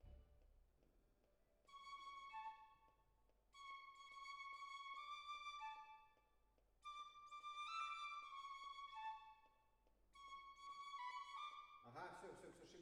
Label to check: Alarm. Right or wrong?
Wrong